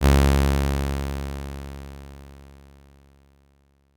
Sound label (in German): Saite D Bass 415Hz, Leise Lauten; Chor 8 (Dd); (Saiten 14 und 15); Stimmton: D